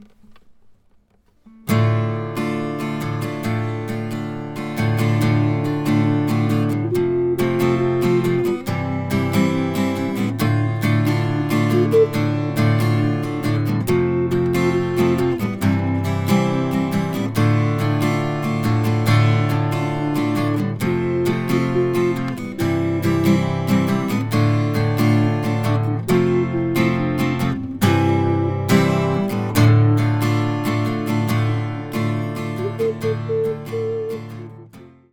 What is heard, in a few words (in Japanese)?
ギター＋バスC